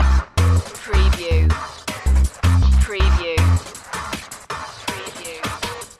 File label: town beat loop